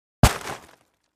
Foliage Lawn Bag with Branches Type 1 Dropped on Ground; hits & impacts